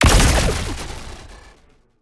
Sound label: Sci-Fi Energy Explosion